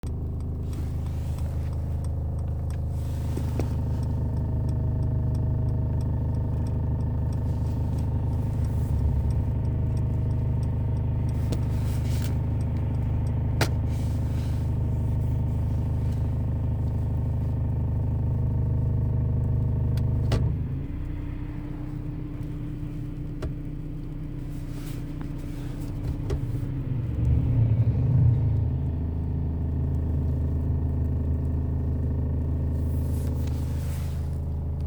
c200 coupe vibration when hold c200 rumble; c-class w205

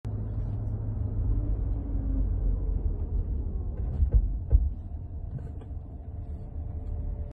gle 350de crunch noise when braking; gle-class v167

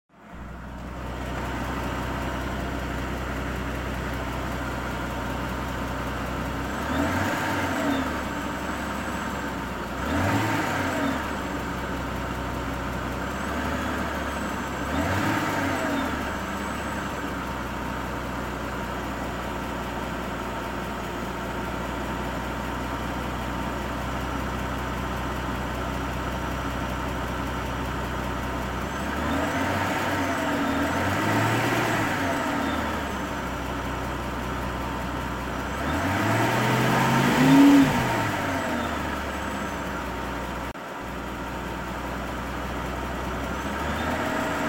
w221 m275 v12 ticking noise record; s-class w221

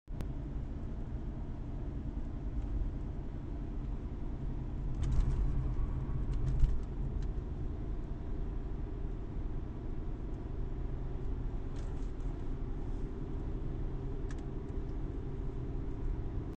c400 road noise; c-class w205

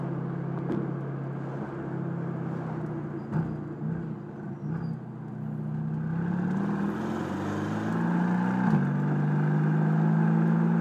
amg gt black series 991 2 3rs comp gtr sounds; coupe roadster

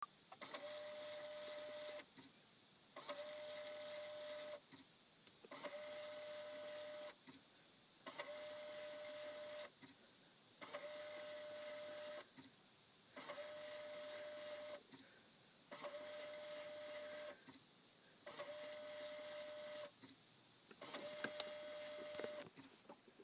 stuck cd command unit cd player command unit; how remove stuck cd

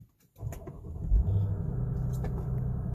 growling sound start up normal startup; sl-class r230

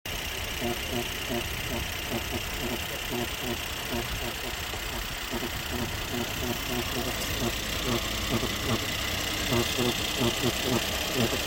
weird sound near engine; glc 43; glc63s glc63 glc43 amg suv coupe x253 c253

